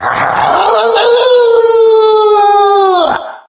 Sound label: werewolf, halloween; Source Engine; tf